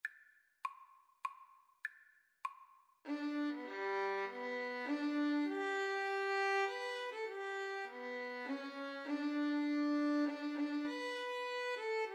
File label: star spangled banner, Violin-Cello Duet